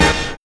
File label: HIT